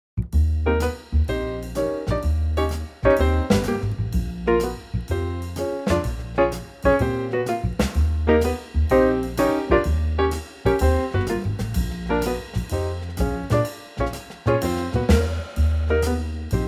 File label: Eb Trio, male; key - Eb - vocal range - Ab to C